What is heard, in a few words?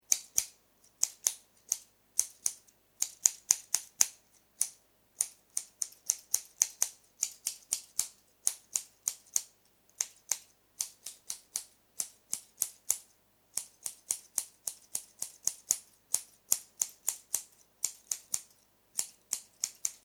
Scissors, Snipping sound of scissors.; Miscellaneous Household